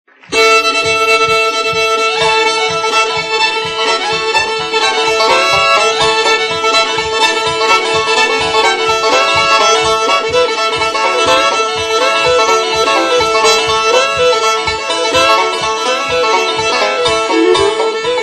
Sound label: Banjo And Fiddle